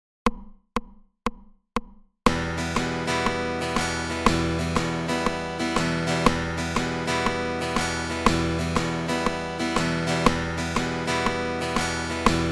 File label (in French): Rythmiques croches sautées 120